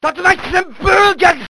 kick; sf ryu

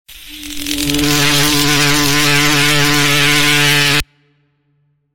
grasshopper

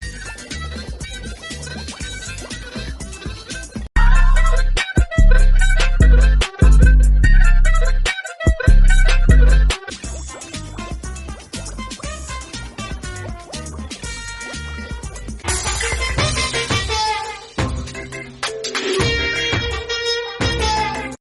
gong boing

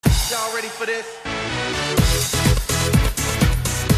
spam jam song

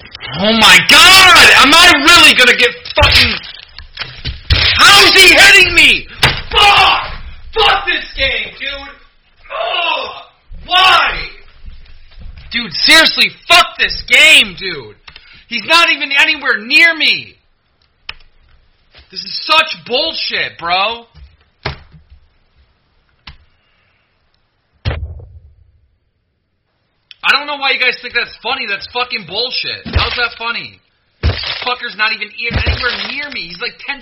raging, scream; keemstar

